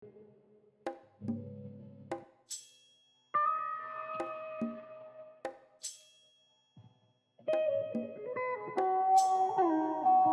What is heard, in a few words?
slo funk cue